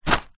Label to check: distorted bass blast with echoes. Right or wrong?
Wrong